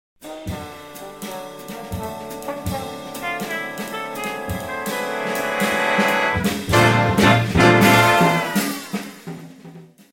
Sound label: Music logo - Big band jazz opening w brass forming pyramid structure; Hollywood Edge Sound Designers Tool Kit